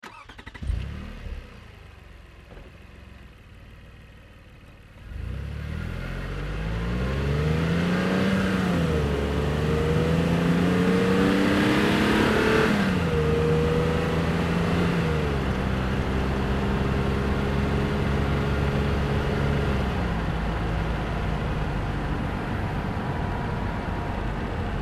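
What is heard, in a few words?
Car Highlander Outboard Drive Fast-Med Steady-Fast; 2005 Toyota Highlander V6; Decel To Med Steady; Accel To Fast; Cars; Vehicles; Big Room Sound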